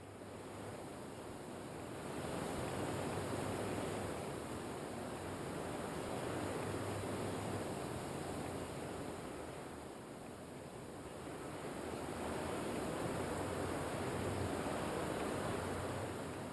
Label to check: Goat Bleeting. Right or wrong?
Wrong